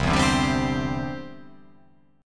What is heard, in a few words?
C-Major Add 9th